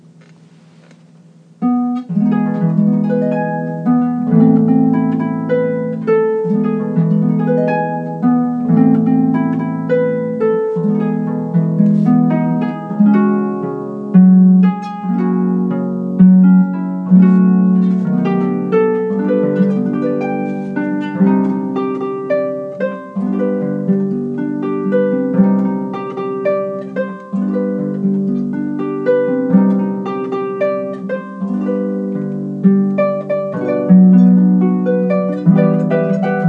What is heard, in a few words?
harp